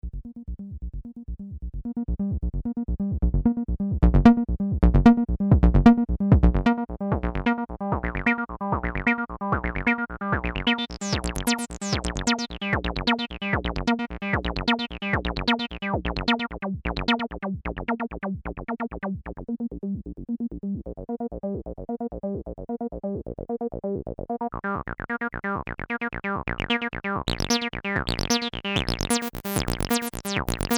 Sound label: Roland TB 303 Classic Line Test